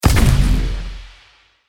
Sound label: mines bomb